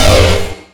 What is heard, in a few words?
mine detonate; Dystopia; cyber